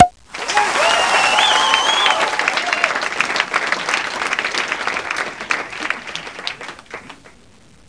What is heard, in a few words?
champagne